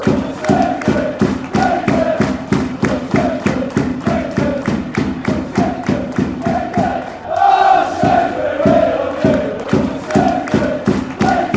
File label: we love you shrewsbury fanchants